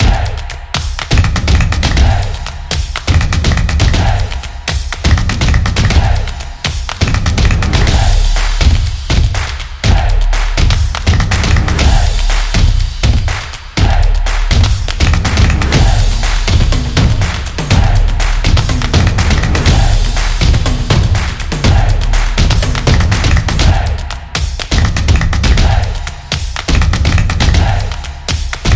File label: stomp clap drum percussion